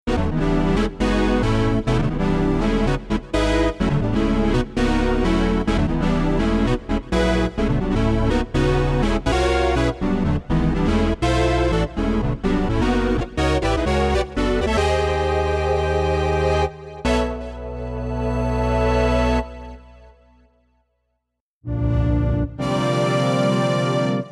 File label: synth; kollasalseries